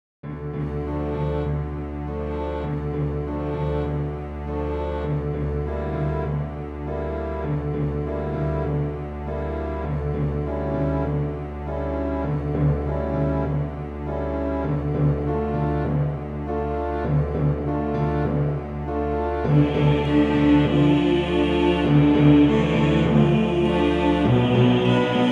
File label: zombie march